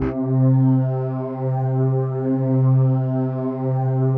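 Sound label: PAD, atmospheric pads; Best Service; XX Large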